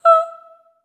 STACC E5, Symphony of Voices, SOP STACC

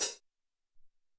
Mid Hat, Alesis SR-18

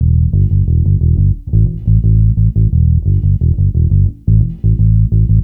Bass Loop, Ambient Grooves

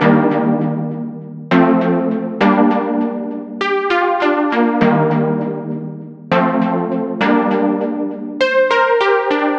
100 G Synth Cool Blue; Zero-G; Future Wave

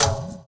EQ Perc, Percussion Mix

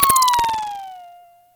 Doppler, Effect; Yamaha DTXpress

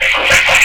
CYBER KIT, DRUMS

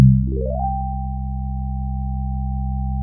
Tlap Syn C3, Fairlight CMI IIe Keyboards; Keyboards2

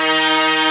MISC, Skanky Perc

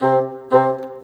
Bassoon, Orchestral, Rock Pop; Sonic Foundry (Sony Creative Software); Orchestral Series VOL-4; Rock and Pop; Rock-Pop 03

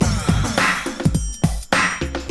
BEAT, Hip Hop, 102-105bpm; E-MU Formula 4000 Series Vol. 1; Hip Hop Nation

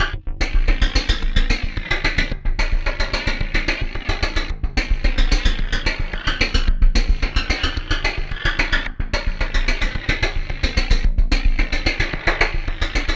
SOLID WN BEATS; Zero G; Malice In Wonderland

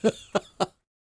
LAUGH, Jazz; Spectrasonics Vocal Planet; Jazz FX

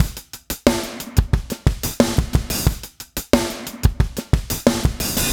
Funk Break, Drum Loops

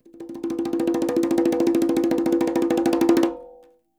Quinto, Percussion

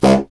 C. Bassoon, solo instruments; Miroslav Vitous - Solo Instruments VOL-1

